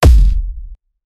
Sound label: v8, engine